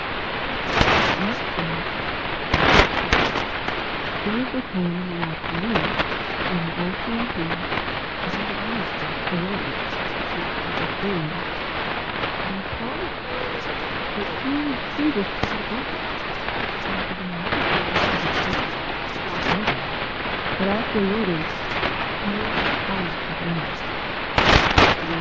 HAARP langley 6900kHz

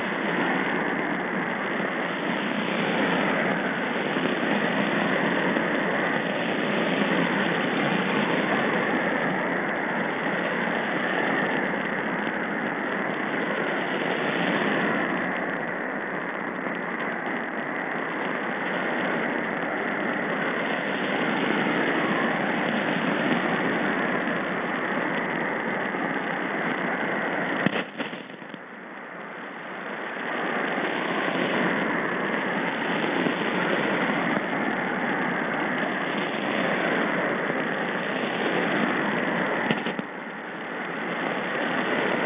voice SSTV

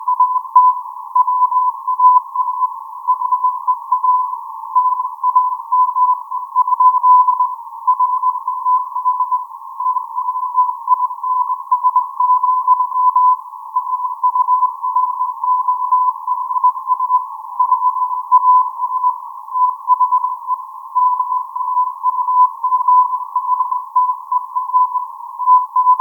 HAARP 9100 kHz; DL1DXX

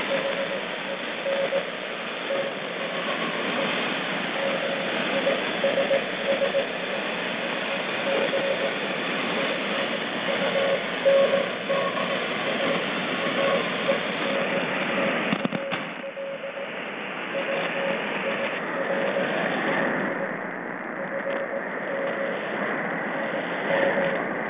Morse

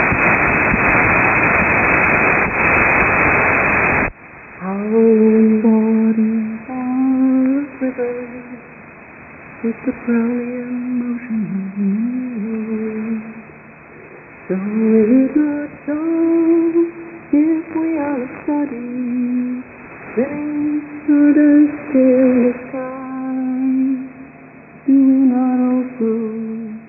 9450 kHz

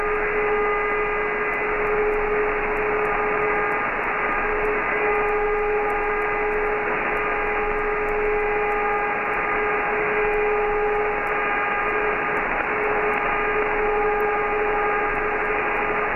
haarp 3.3 mhz; haarp xiii

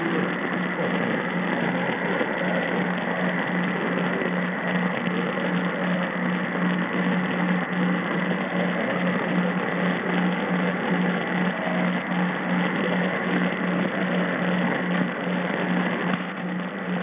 2.8MHz QRM

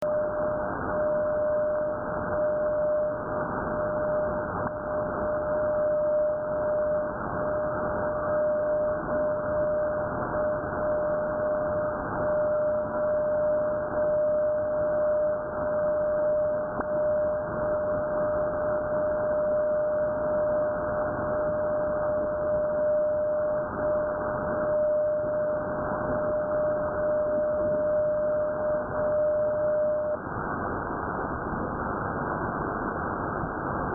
Sound HAARP 9450kHz

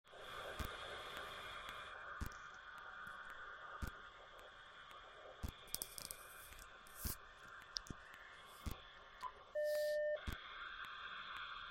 CW - 9.1 MHz; Ghost in the Air Glow

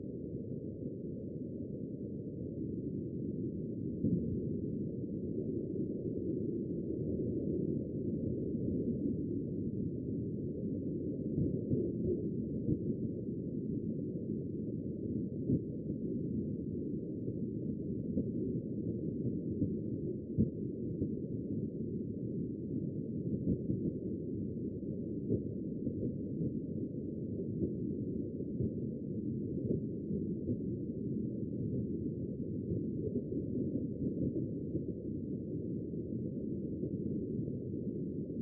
9,45MHz

